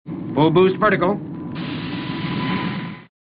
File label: Full Boost Vertical